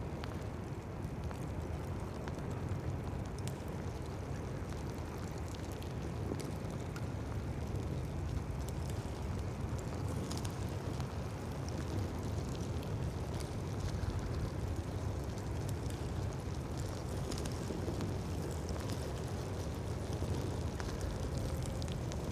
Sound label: lava